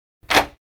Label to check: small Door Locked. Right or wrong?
Right